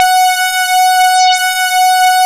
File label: ALLROUND F#4, LEAD SYNTH; Best Service Alpha Dance vol.2